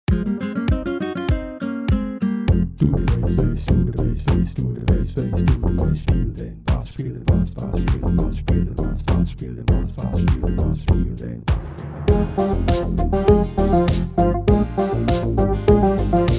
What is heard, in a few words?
HIPHOP